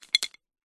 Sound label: food savory snack wasabi pea single drop in ceramic bowl; zapsplat